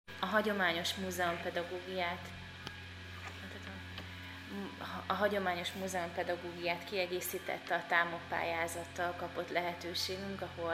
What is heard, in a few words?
digitar